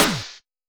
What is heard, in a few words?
Snare, Trap